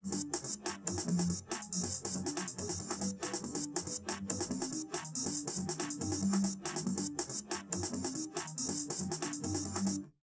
drums; SiSEC11; underdetermined

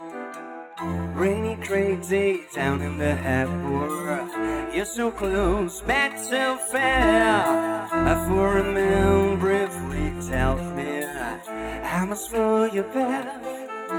vocals; SiSEC11

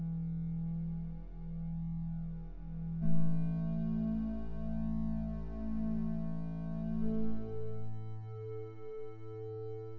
wdrums synthconv

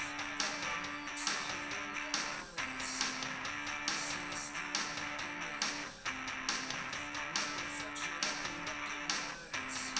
wdrums liverec 250ms 5cm src

